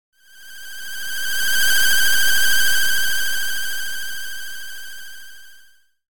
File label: ufo